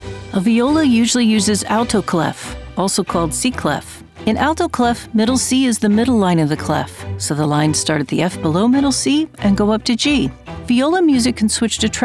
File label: Viola; eLearning, illustrative, upbeat